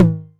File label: Tom Hi, Novation Drumstation; Novation DrumstationV1